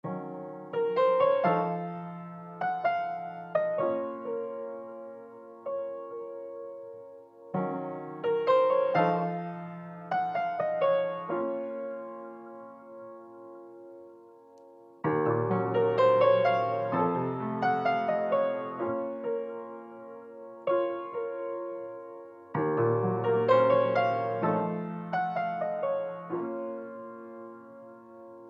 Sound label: RnB Melody Loop Amin 128 BPM Piano